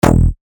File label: bass shot E; Toga; revealed bass shots vol 3